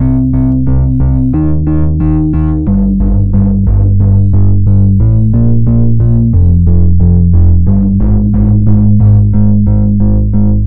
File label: bass